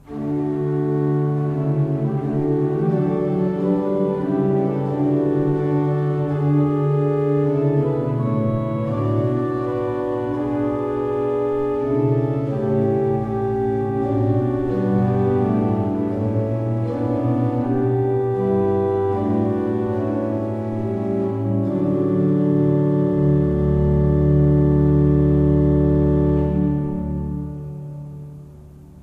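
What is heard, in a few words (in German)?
Seifert Koeln, pfeifenorgeln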